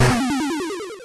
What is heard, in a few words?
line cleared, tetris; tetris window